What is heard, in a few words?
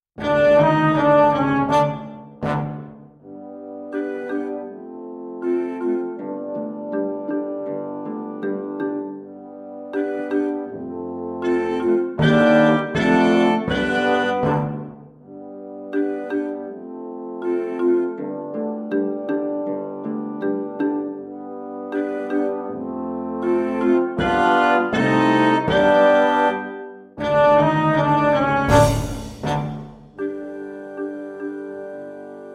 backing track